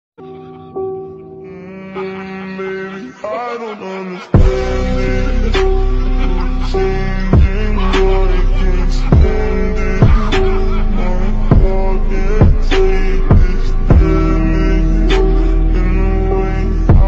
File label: sad alone emotion bgm